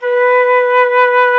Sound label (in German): FLOTE, Alpine Volksmusik; Best Service